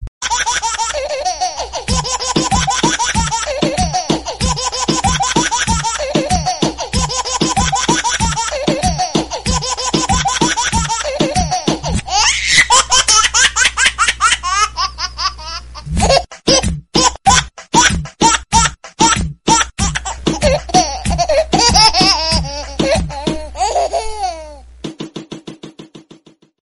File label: Funny Baby